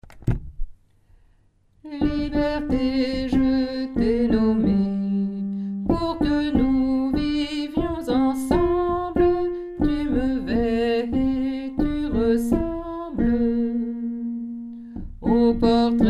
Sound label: alto